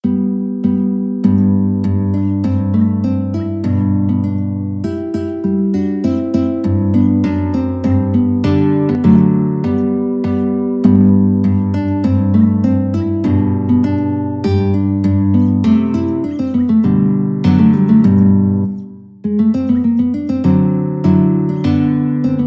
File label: Romanza, guitar